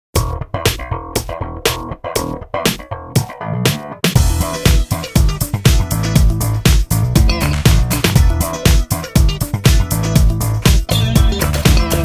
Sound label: Slap On, Nokia Tones; Windows Phone apps